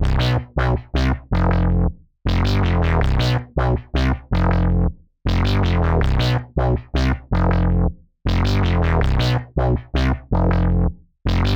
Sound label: instrumental